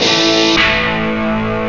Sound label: Power Fifth